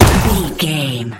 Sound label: sci-fi weapon plasma pistol